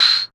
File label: CR-78 Tamb, Tambourines; Percussion